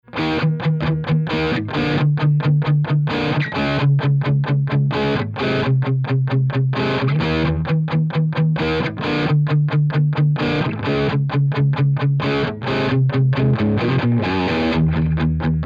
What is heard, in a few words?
Guitar